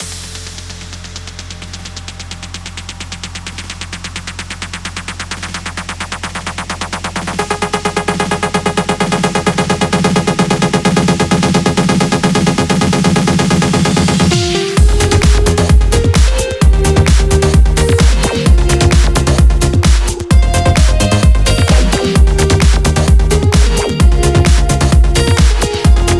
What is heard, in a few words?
130BPM Mix; Vengeance Samples; VFH1 130BPM Motor City Kit